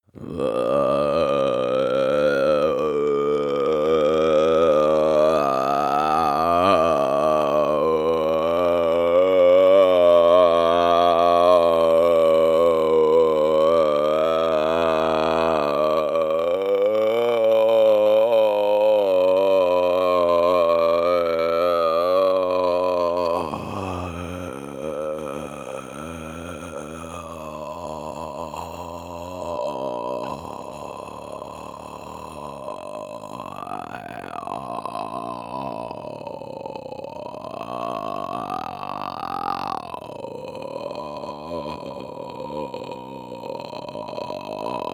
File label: male growl larynx long; menschen; koerpergeraeusche; soundpool